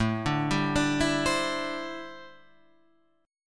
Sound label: D 7th Suspended 2nd Suspended 4th A